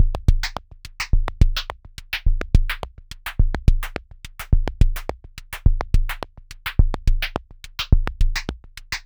Blue Screen Beat; Ultrabeats

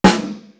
BD AND SNARE; East West; Global Sounds

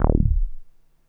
NASTY BASS

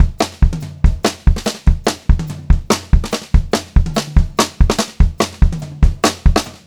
144 SPEED, Pop-Rock Drumloops

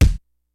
KICKS; Kid Nepro; Elite; KICKS SNARES

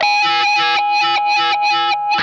OVER WAH, Funk Guitar; 90 s Sample CDs; Zero G

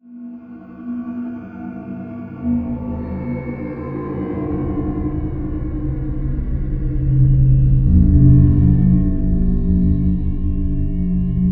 SPACESHIP; FLYOBJECTS

